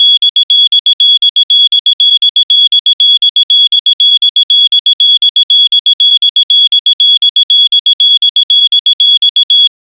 create a timer bip

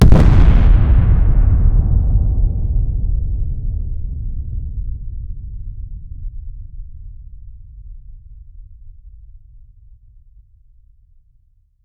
lo-fi muffled underground, battlefield

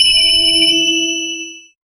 bell chime

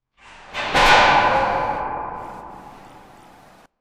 parkour vault, parkour; mirrors edg

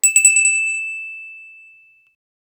deaf crunchy jewel pickup; jewelry

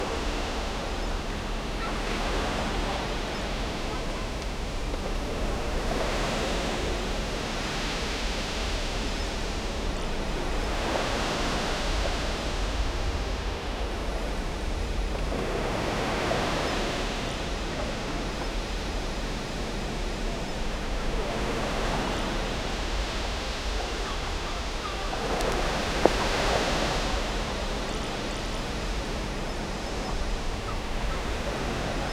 cinematic beach scene wid

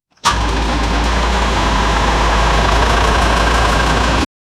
truck starting and going

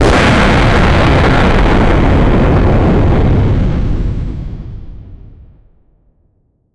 atomic

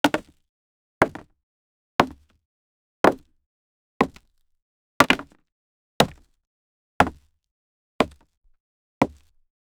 impact wood break

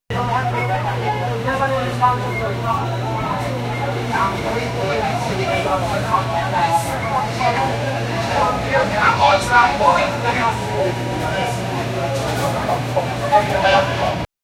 the busy pizza, restaurant

